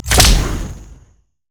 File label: pick a card red card; LoL; twistedfate